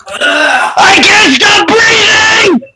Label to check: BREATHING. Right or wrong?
Right